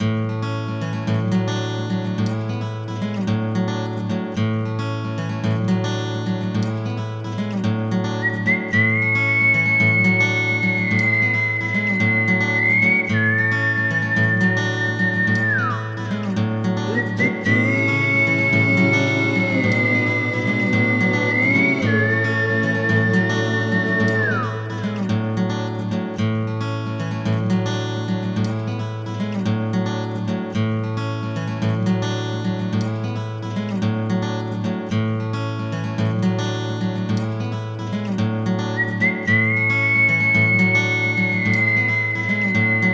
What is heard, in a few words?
Instrumental; Phas Gaye Re Obama (2010)